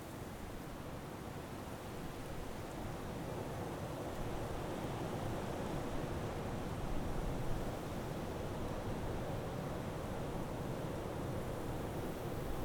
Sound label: outdoor islet windy; anemoi